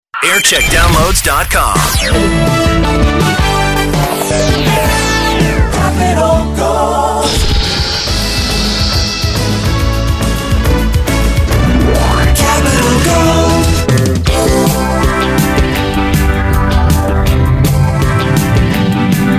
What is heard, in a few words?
jingle montage; capital gold; 2000